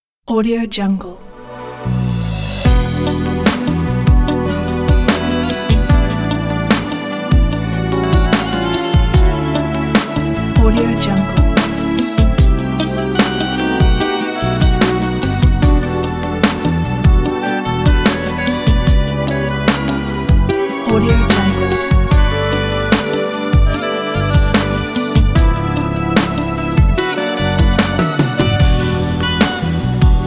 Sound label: relax saxophone soul music